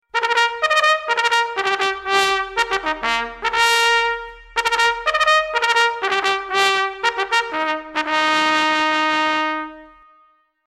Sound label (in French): Sonnerie Ouvrez Le Ban